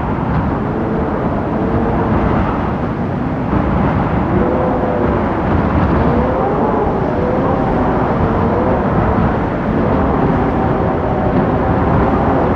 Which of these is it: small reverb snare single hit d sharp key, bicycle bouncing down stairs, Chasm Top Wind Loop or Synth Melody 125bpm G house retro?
Chasm Top Wind Loop